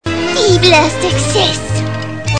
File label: success